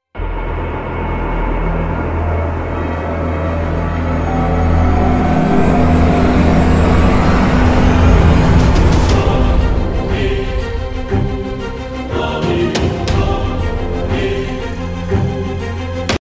Ilyushin Il 62

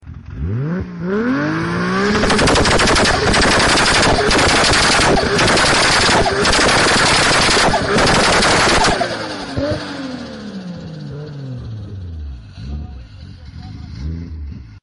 Supra Backfire

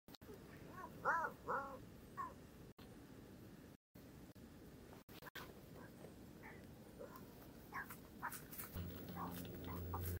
pallas's cat

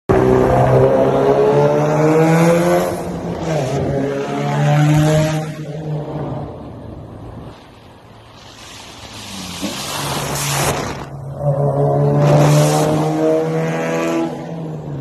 mitsubishi; اگزوز

